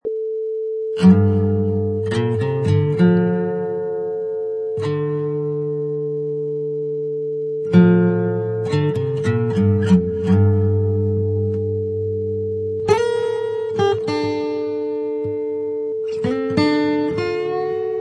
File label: Acoustic Blues Riffs